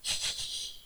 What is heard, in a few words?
hehehe